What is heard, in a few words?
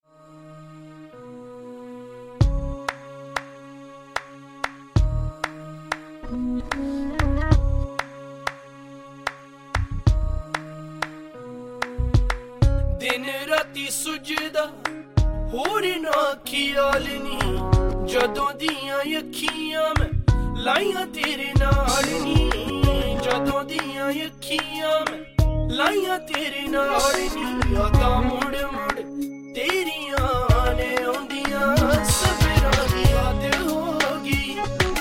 bansuri; dzuddahord